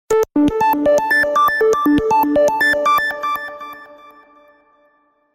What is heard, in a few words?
new iphone x notification tone